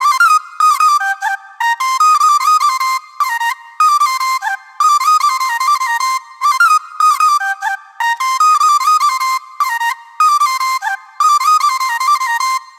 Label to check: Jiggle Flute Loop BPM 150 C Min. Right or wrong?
Right